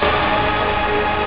noise, rave

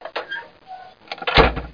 sub door

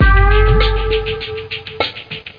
Beat

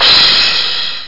cymbal crash; OctaMED SoundStudio V1; drumkit; cymbals

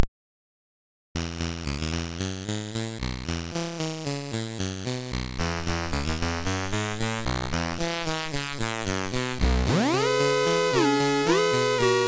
siren walk

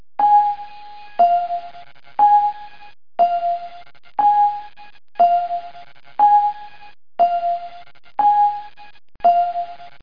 bing bong; Radio Active - The Music Trivia Game Show

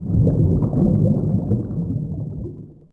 bubbles; DAoC

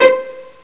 PLUCK A

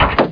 button, buttons; Hexen2

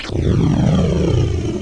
Warp Out; Rescue! 1.6

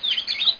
chirp

